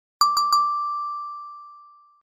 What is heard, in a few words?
Correct